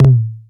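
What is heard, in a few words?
lo tom flam, drive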